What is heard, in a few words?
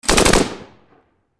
Gun Machine Gun, 7.62 Caliber Bren Lmg Short Burst Close; Automatic; Close Perspective; Warfare; Blastwave